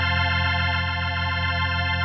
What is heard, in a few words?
KEYS; MyMix Recordings NAMM 2018